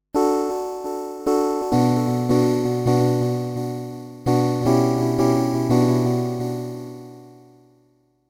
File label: Loop Forward